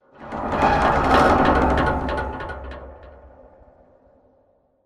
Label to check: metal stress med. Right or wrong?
Right